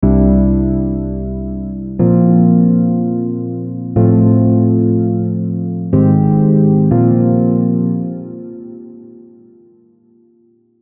Chord Progressions C Major Prog

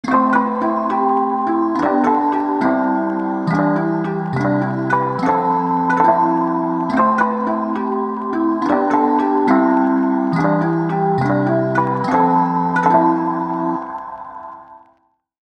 Tide Am 70, Lo-Fi MIDI Melody; Unison Lo-Fi MIDI Melody Collection

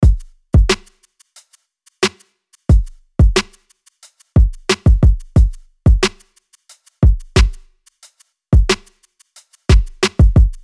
90BPM Drums Chill Bro Full; Unison Artist Series Essentials

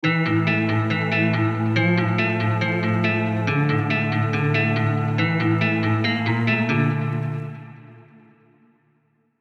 140bpm, Phrygian; MIDI; Unison Phrygian Advanced Scales MIDI Collection

